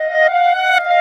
Clarinet Effect; Sonic Foundry (Sony Creative Software); Jazz Solos and Sections; Effected

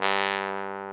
BRS BONE, Solo T.Bone; Roland L-CD702; Solo Bone 3

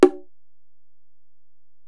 Ac Perc, Drum Machines Alesis DM-PRO

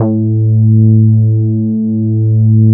SB HORN; Wizoo - Powered Nord; STEREO LAYER

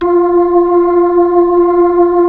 B-3, Keyboards of The 60's and 70's; Club Xfade

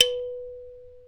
PERC, Dance Mega Drums; Best Service; PERC MIX

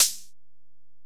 HiHat, Alesis DM-PRO

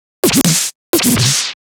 Scratch Fills 140BPM

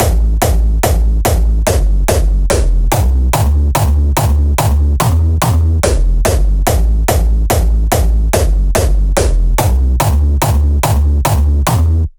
144 BPM Jump Kick; Vengeance - Essential Clubsounds Vol.5; Flight Kit Root Amin; Drums & Perc

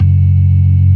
CLASSIC C3, Synth and Organ; InVision Interactive; Keith Emerson; The Most Dangerous Synth and Organ; CLASSIC C3 4

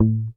P BASS MUTES A2; Sampleheads; Will Lee Bass Library

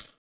RSS PERC

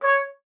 trumpet c#5, Trumpet stacc piano

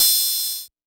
kit, Yamaha Motif-ES8

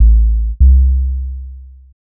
Bass, Futurist Drum & Bass

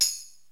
TAMB; Crypton - DrumBase5000

